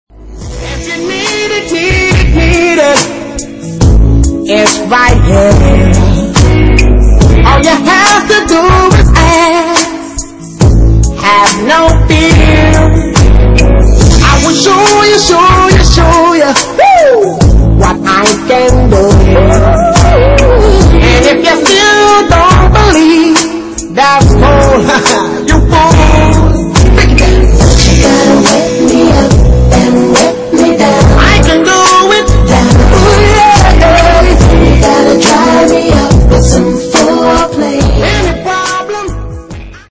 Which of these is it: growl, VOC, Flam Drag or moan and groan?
moan and groan